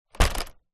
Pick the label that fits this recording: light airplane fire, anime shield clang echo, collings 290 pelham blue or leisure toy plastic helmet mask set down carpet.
leisure toy plastic helmet mask set down carpet